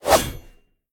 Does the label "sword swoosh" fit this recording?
Yes